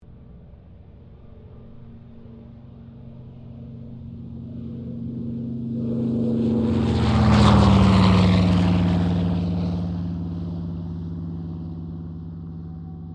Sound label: AIRCRAFT CA-13 BOOMERANG TAKEOFF; Airplane Sound Effects; Airplanes (Sound Effects:Vehicles)